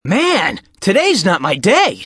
death; sonic

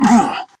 pain; tf

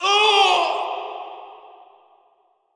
scream

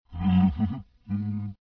jump; tron

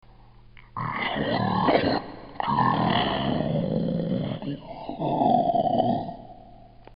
zombie; dod

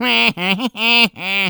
mock; tf; waluigi

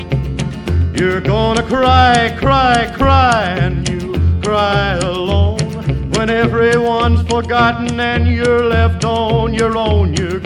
cry cry cry; hl2dm

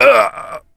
heavy pain sharp; tf; duke

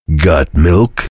heavy laugh evil; tf; duke

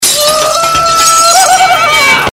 wuhuhuha; hl2dm; oppressive territory

